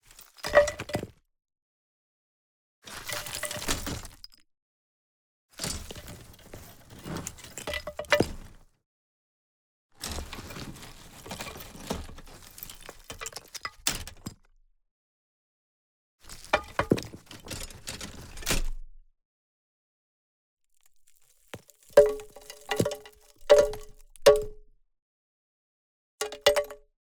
Debris Falling On Ceramic Roof Tile With Metal Trash; Justsoundeffects; Stones and Debris